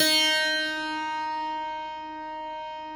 Harpsichord +ped, D2; Classical Prepared Pianos; Best Service ProSamples vol.53; Total Piano

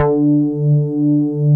MELO D4, MELLOW BS; Drums In Your FACE!

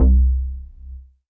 Synth Prophet Bass C; Electronisounds

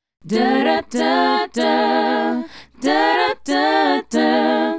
Female Group, Hip-Hop and RnB Vocals

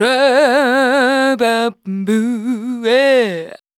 FEMALE, Dance Vocals

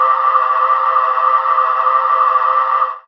pad c, synth pads; Best Service ProSamples vol.36 - Chillout

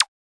Drums; Distored Reality

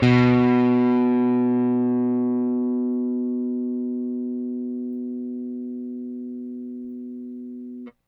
Rickenbacker Bass; Airwindows

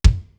GOOD KICK; PROC.BDS; East West; Global Sounds

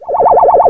NOISE

